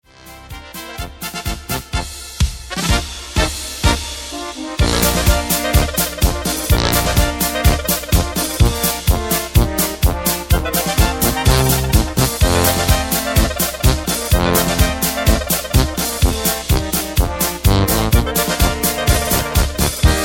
Polka Playback

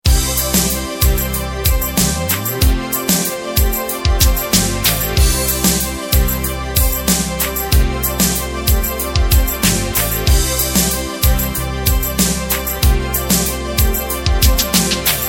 Al Ritmo di Beguine Playback

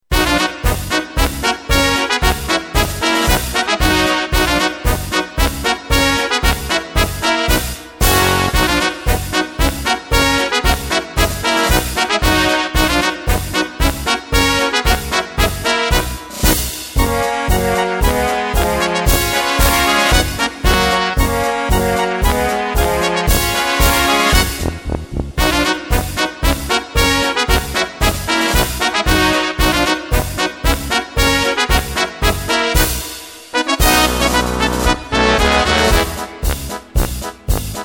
Polka Playback; midifile

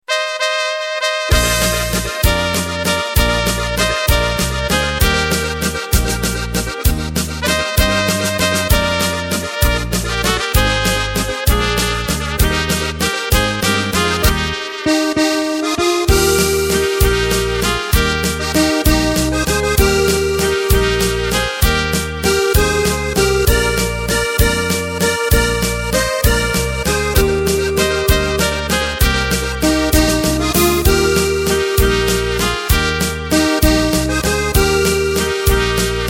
Drums

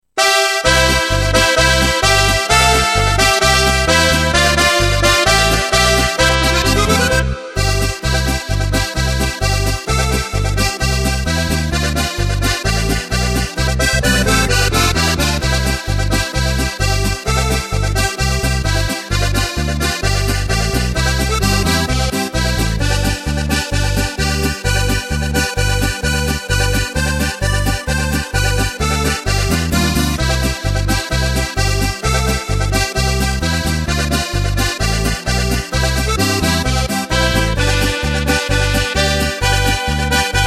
Es ging bei hellem Mondenschein Playback; midifile

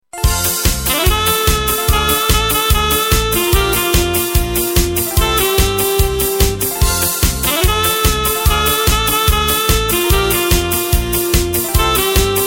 Oldies Medley Playback

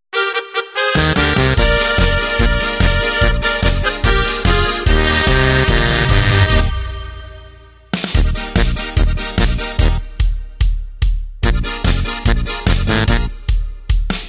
Geh Alte Gib Ruah Playback; midifile

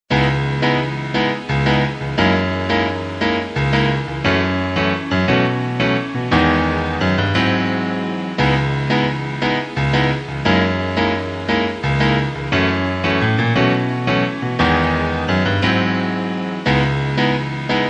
sax instrumental; midifile

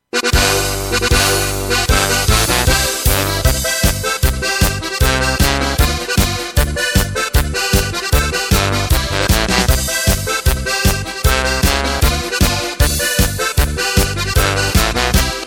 Hallo Liebe Freunde DRUM Playback LYRICS

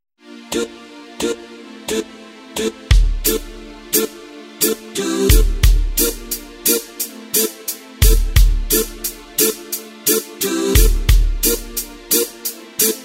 Reggaeton

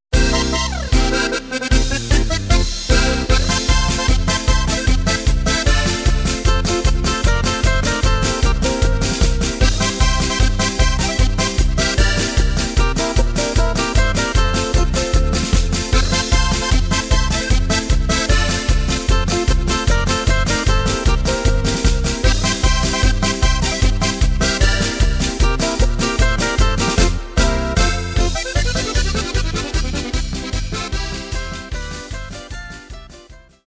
Polka

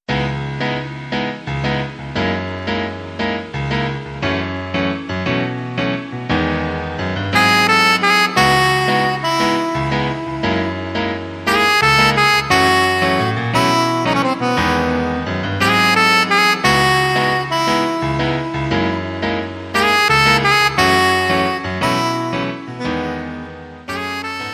Sax; sax instrumental

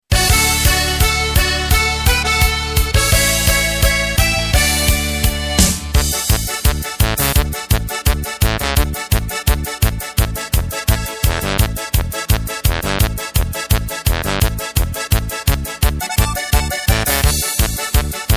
Friede Freude Volxmusic Playback; midifile

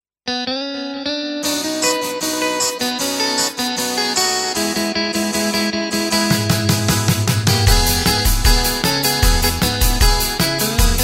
Swing

